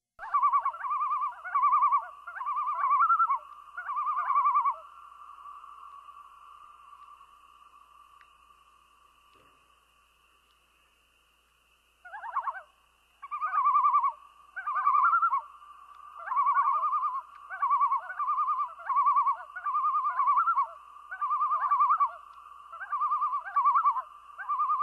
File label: tremolo